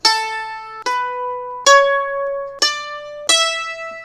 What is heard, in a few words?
test notes, mando